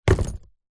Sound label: shoes business leather buckle zipper womans set down on wood floor; zapsplat; foley